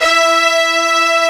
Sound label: MIXBRS SEC E4, MIX.BRS; AKAI S-Series CD-ROM Sound Library VOL-2